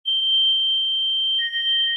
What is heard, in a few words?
sonar Ping Water Far Beacon; Barotrauma; Real Sonar